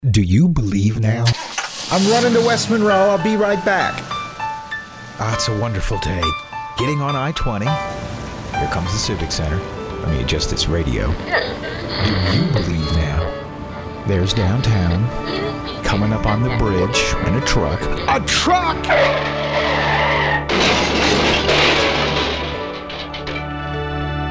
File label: traffic